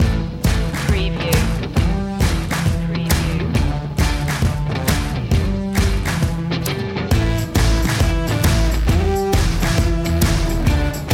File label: start it up loop